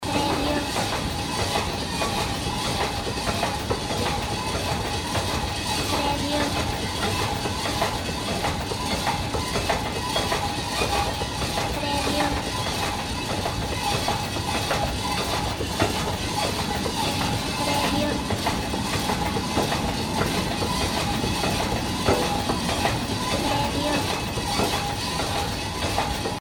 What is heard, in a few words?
Escalator at Station